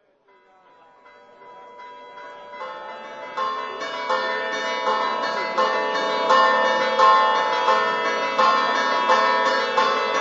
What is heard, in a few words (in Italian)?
fiumicello, campane